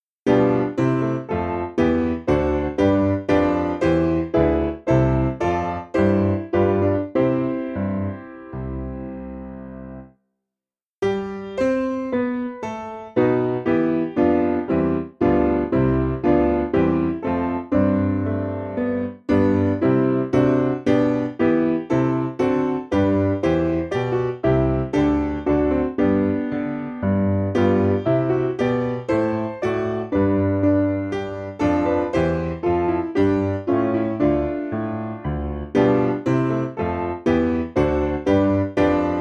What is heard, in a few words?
piano, hymn